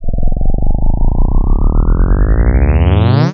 Sci-Fi, Classic SFX, cartoon; musicradar; cartoon caper

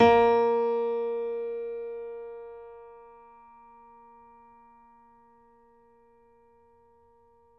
piano; East West; The Ultimate Piano Collection

